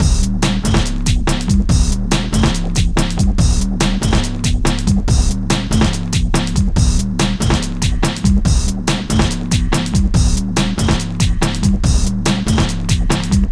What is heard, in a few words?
Hardcore Breakbeat, 142 Cmin; Big Fish Audio - Hardcore Breakbeat Action; Method Machine 142 Cmin